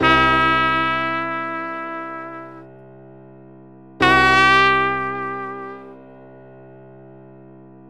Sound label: Blue Notes C7